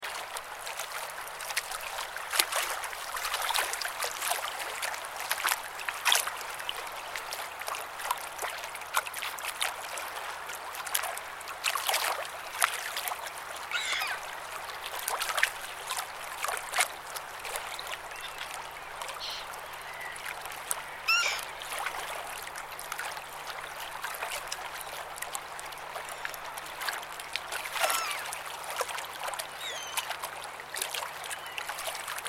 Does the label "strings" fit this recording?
No